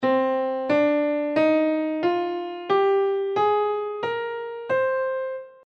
natural minor scale

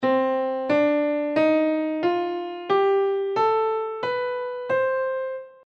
melodic minor scale

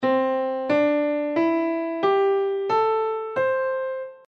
pentatonic major scale

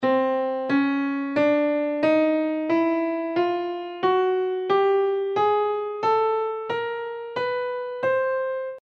chromatic scale

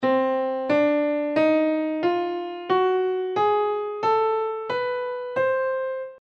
whole half diminished scale

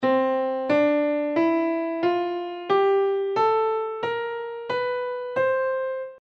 bebop dominant scale

